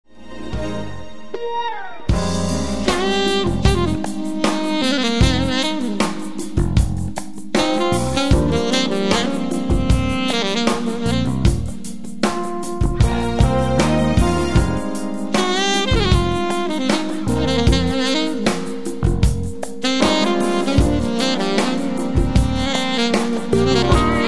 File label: instrumental